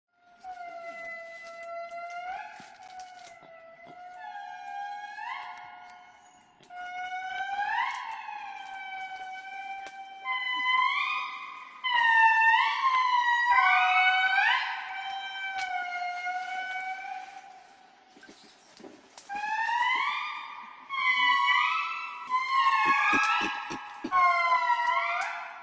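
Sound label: Lemur voices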